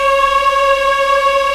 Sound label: African Mm p; Roland LCDP11 Africa VOL-1